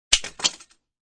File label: Mouse Trap, Mouse trap pops; Machines